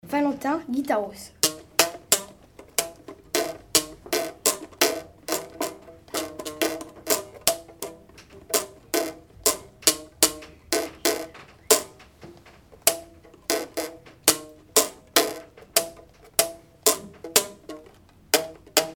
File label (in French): guitaros